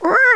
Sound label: Inflate; Adium